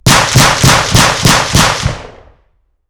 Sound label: Heckler & Koch G36C 5.56mm 50m behind clean Six shots; Ehrlund